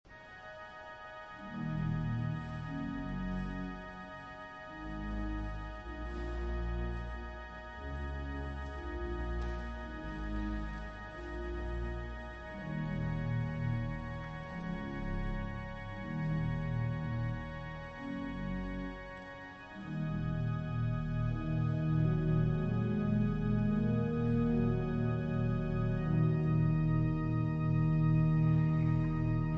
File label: Organ interlude; Colloquium; Mass